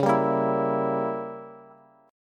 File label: Db Diminished 7th Db down Bass; strummed; JGuitar